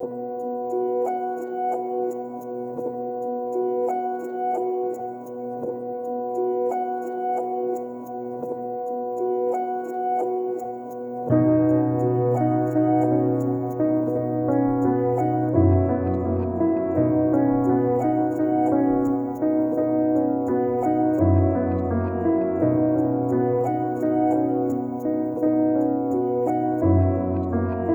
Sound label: 85bpm gentle piano life affirming